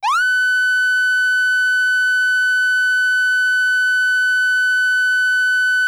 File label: Federal Signal Siren Tone UNITROL YELP